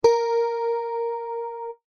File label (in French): guitare